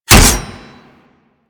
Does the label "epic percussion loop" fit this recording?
No